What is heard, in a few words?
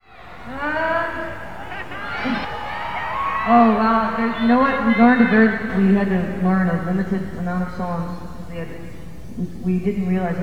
crowd talk waitin for a guitar